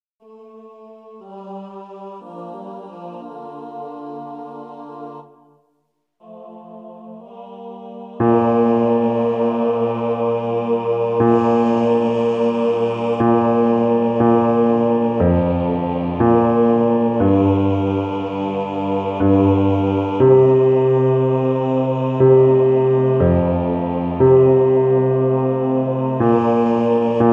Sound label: bass solo; Las Posadas